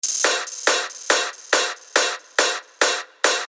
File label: techno loop 140 BPM; Protocol; Revealed Techno Top Loops Vol. 8